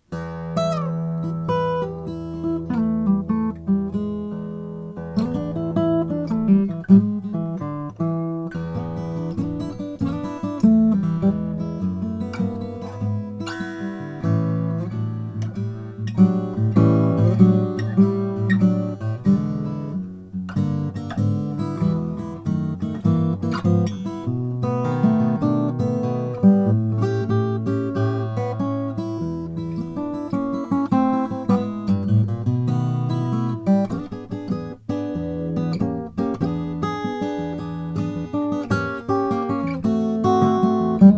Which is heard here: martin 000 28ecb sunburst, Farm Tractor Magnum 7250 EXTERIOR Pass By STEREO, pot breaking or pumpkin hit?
martin 000 28ecb sunburst